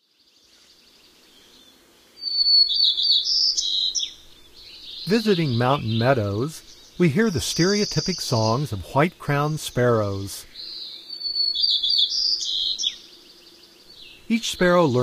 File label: White-crowned Sparrow; North America; Common Western Species; Know Your Bird Sounds